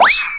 throw; Universo Animado

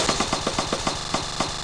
stop; Funhouse of Love

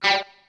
Wah gtr; guitarras

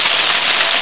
scrape

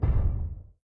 foot r; Starsiege

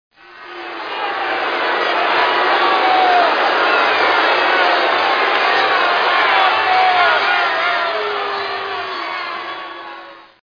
BOO; SOMC Family Forum

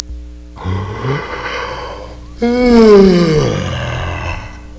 YAWN; Sound Library 2000; Wayzata Technology; People

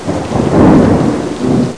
rain hat; PUZMANIA

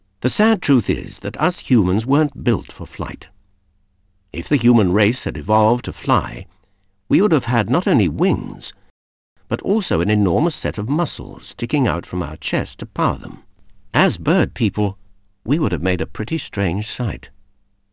people

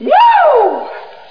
woo

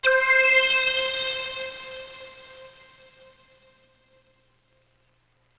Sweep Up; Dance

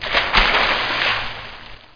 splsh; Tempra Access; vision

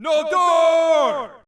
men yell

